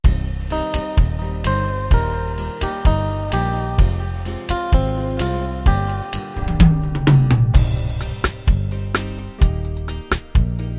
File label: 60s Guitar Pop, rhythms; mzx500; preview pack 01